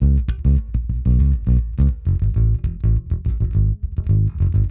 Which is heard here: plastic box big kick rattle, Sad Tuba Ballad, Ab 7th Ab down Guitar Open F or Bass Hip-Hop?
Bass Hip-Hop